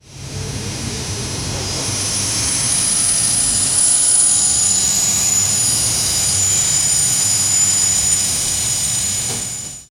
a high fidelity realistic, railway

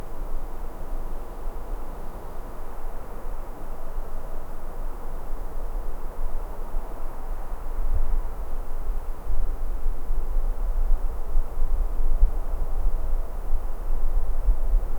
quiet air ambiance, beach; quiet air ambiance on a

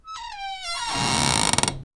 mirror glass door creak

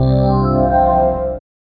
a short synthwave tone

